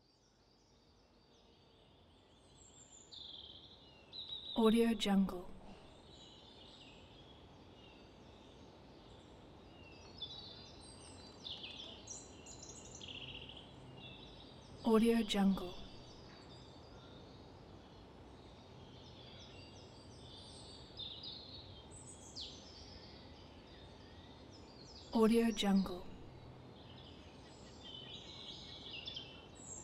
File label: birds singing and insects